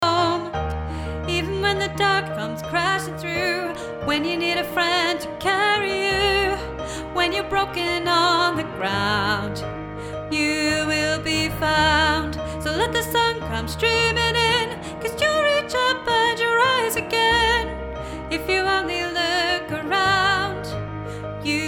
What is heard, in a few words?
mezzo sound bite